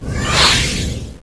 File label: Swish Effect (end of level); Star Flick